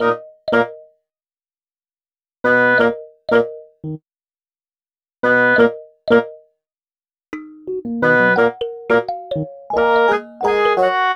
Horns

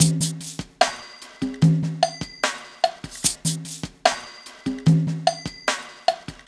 Percussion Prime; Sonic Foundry; Sony Creative Software; Cinematic Funk Urban Tone Poems

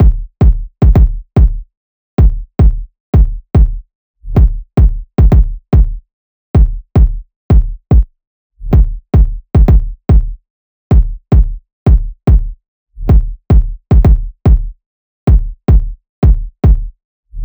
loop kick 110; Seismic Loops

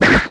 SWISH SEQ, swishes; Total Control - Action Cutz

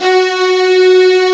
F#2, VIOLA SECT; AKAI S6000 CD-ROM - Volume 1; Strings

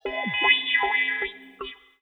FX Pannekoek Computer; Polyend; Legowelt

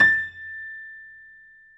piano; East West; The Ultimate Piano Collection

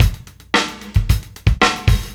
live beat; Sonic Foundry (Sony Creative Software); Ugly Remnants VOL-2

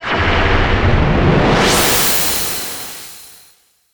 Sci-Fi, Classic SFX, cartoon

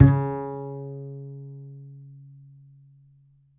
D, Upright Acoustic Bass

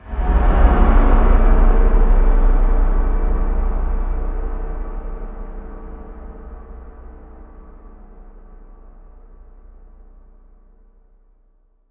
Sub Kicks, Bassdrums

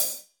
HH, Drums; Bob Clearmountain Drums I

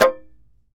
tar; Roland - LA Composer vol. 3

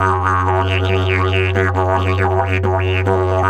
F 140, DIDGE RIFFS; Big Fish Audio - Didgeridoo and Other Primitive Instruments

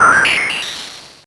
POLYMOD; AMG - Vince Clarke Lucky Bastard

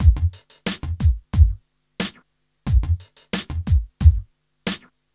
Smooth, R&B Drums

